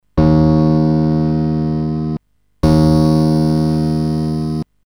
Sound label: piano